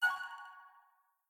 Win Count Up End; netent; longpao

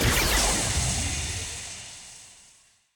win Symbol Med Snd; netent; starburst

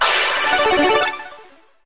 small Win; netent; reelrush

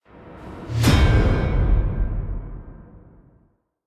orb Add; netent; wishmasteroct

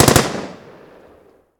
Police MG; netent; narcos

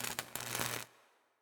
betline Bolt; netent; wishmasteroct

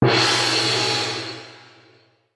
big win start; netent; piratefromtheeast

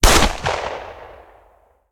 Police HGun; netent; narcos

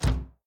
quick stop; netent; kingof3kingdoms

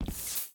quick Stop; netent; fortunerangers

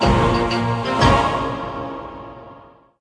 small Win; netent; bloodsuckers

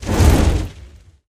near win flames; netent

